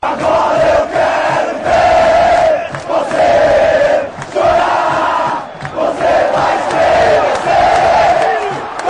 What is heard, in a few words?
agora eu quero ver, fanchants